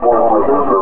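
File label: daed search; daedalus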